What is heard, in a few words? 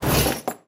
chest move; talking tom gold run gamerip 2016